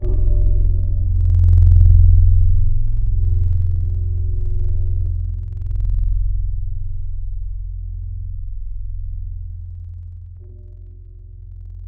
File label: Chicken; Synthetic's Serum Collection; Void Serum Bank - Tables